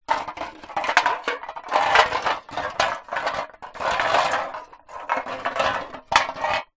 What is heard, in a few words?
drink cans x2 empty movement roll concrete ground; foley